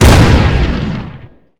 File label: Cannon Turret Shot, Weapons